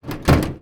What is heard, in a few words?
metal door close; cargo